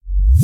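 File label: drum kick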